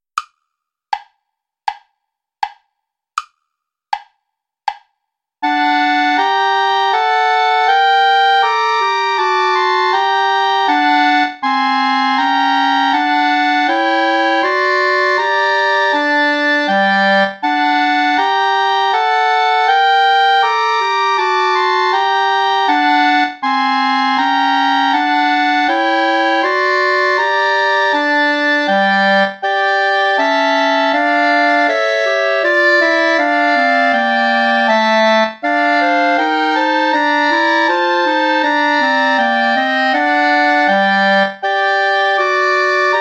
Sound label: play along without clarinet; bach johann sebastian; god from heaven look